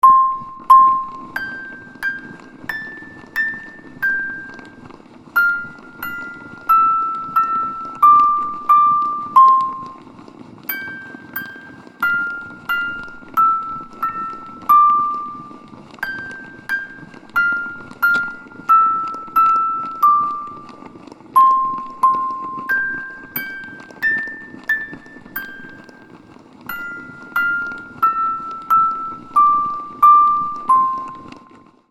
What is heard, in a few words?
Twinkle twinkle little star music box with noise